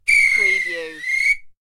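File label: Referee Whistle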